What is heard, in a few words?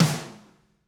Snare; Superior Drummer 3